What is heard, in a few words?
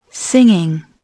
singing